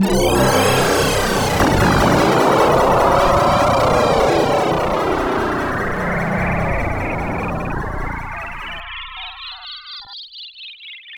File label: Explosion; Gauntlet IV